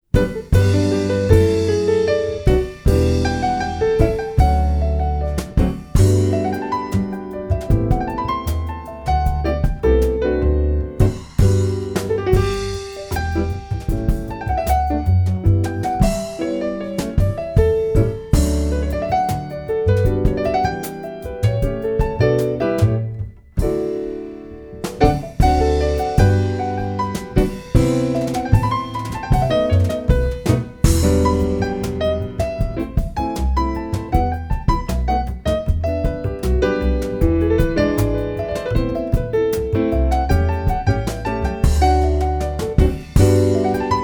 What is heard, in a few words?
Variations, for keyboard